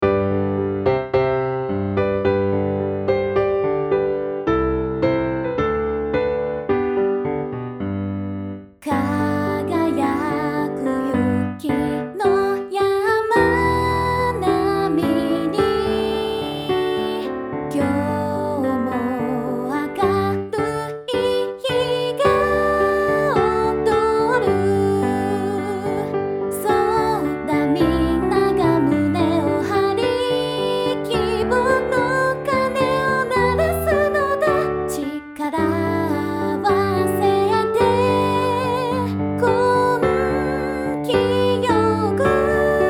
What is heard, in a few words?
piano; 富山県教育の歌（伴奏）